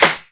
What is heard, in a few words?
Snares; Vengeance Samples; VEH2